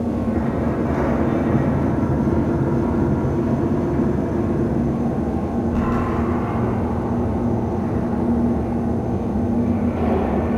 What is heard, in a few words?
Galleon Corridor Ambience; Warframe; Grineer Galleon